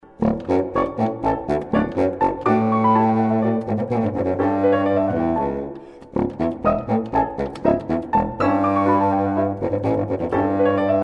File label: Bassoon Et Piano